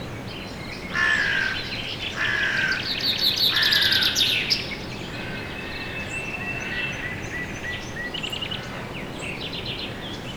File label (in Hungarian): egy csicsergő madár is, dolmányos varjú; termeszetben